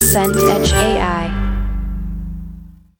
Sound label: sting dramatic